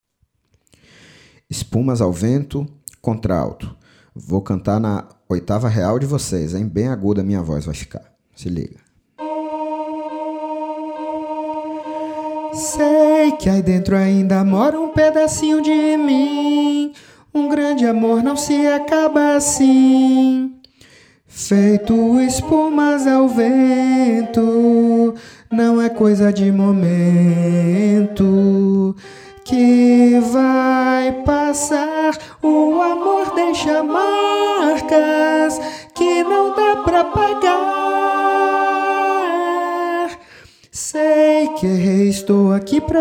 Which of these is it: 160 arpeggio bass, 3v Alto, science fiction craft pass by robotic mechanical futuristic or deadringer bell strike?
3v Alto